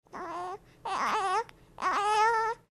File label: Aye Aye